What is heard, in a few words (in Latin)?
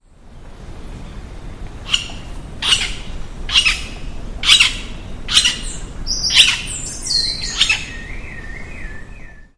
Celeus flavescens; JD Botanico SP; Brazil